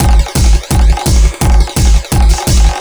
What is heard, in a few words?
LOOP, Eurotech; Sounds Good